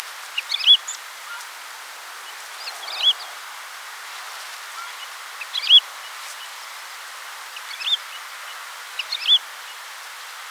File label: Woodlark, Heidelerche